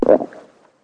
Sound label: take damage; Barotraumatic; Psilotoadmonster